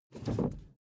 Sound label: suv 1st gear release fast; verkehr transport; autos